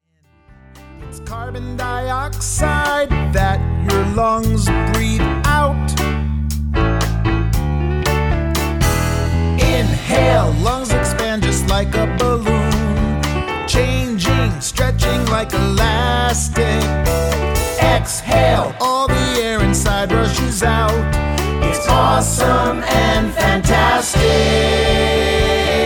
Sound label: Inhale Exhale